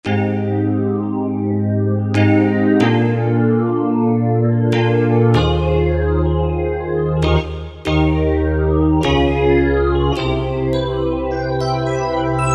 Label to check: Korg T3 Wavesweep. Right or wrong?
Right